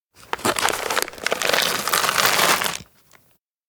popcorn kernels show; anemoi

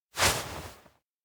bow head; aino; anemoi

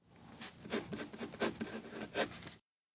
write name; spica; anemoi

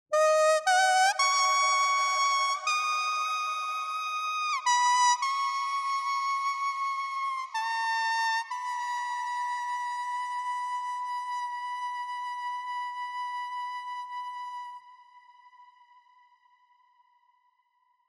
kusabue; anemoi

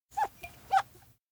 glass polish; ken; anemoi パッケージ版 1.1

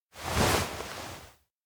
hug; koyomi; anemoi パッケージ版

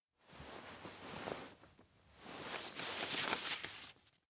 paper takeout; anemoi

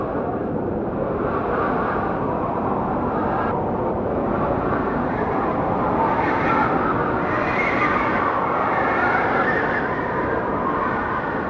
wind strong dark; anemoi

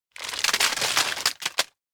mochimaki snacks drop; anemoi

